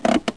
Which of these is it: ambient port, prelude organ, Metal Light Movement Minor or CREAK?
CREAK